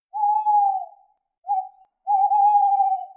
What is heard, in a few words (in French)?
hulotte